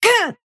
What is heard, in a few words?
pain small